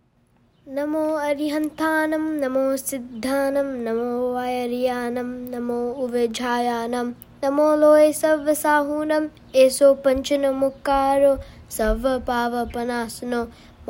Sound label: Navkar Mantra